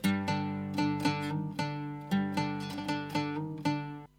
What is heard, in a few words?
Oud Solo